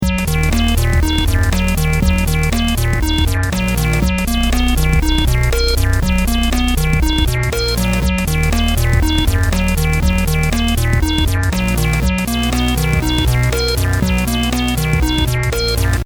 invert wet

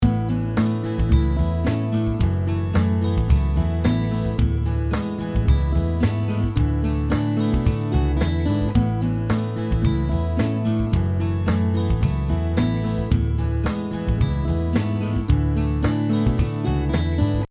TUBE TAPE HARMO

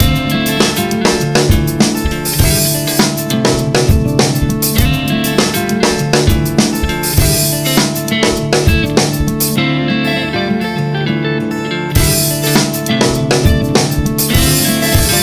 R VERB

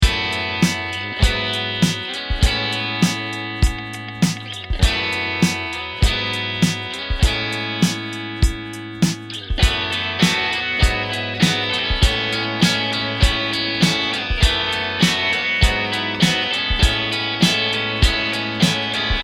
ANTELOPE BA31DI

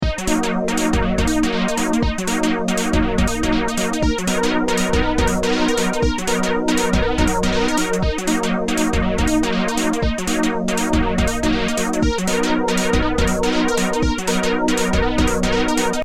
arp ↓↑

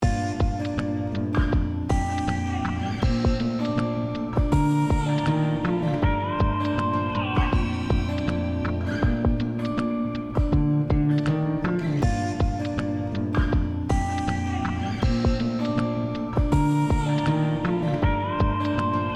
vintage fast

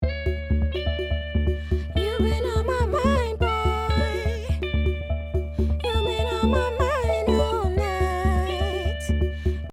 folmant

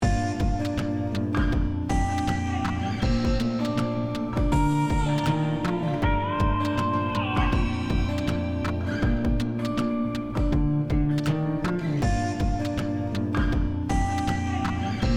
volt all comp fast overload

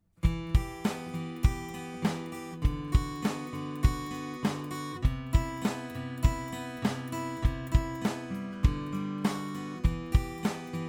AKG C 451B neck left

